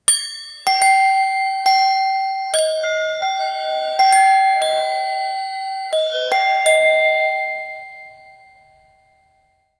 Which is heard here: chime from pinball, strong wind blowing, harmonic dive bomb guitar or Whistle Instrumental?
chime from pinball